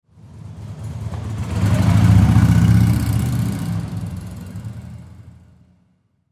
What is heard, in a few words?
Motorcycle Drive By; Transportation Automobile; Blastwave